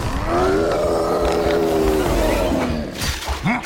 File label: Roar